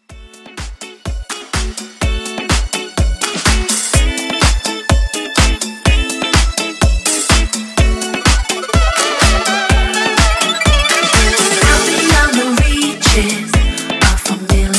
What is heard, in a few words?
Deep House, 125 Bpm